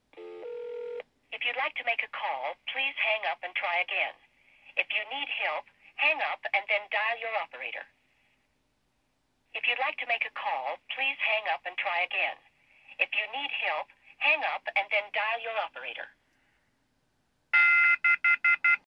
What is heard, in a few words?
perm signal, Permanent Signal; Verizon POTS